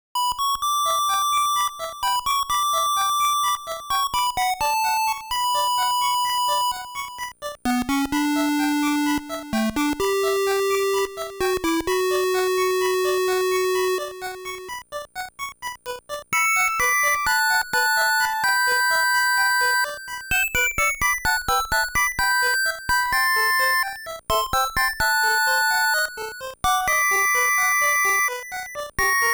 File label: Ocarina Practice Version; legend of zelda the link's awakening